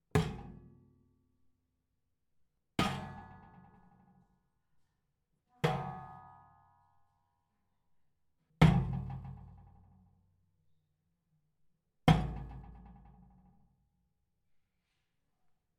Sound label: Barrel Bangs